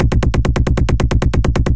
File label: loop flak38 bass; guns; tanks; War Thunder